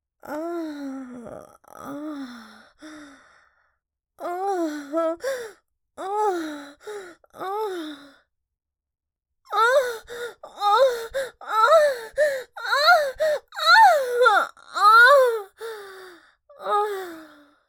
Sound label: moans climax